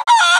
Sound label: Chicken; Ed Edd n Eddy; Adromulus Pack; EEnE